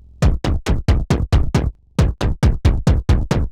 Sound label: Noise Kick 136, horrorscape samples 136bpm